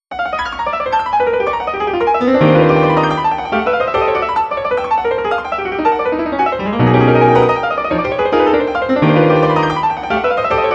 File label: etude gs mix mono